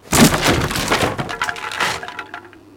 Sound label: break wood